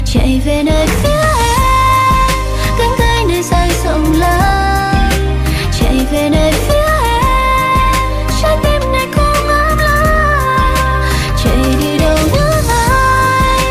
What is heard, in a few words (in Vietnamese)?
Nhac Chuong Cover